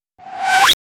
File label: short sharp tire skid